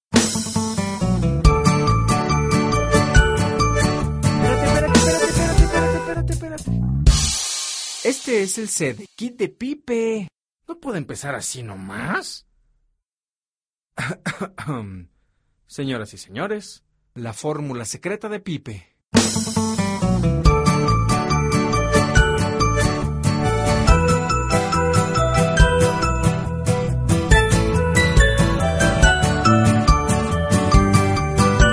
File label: pipe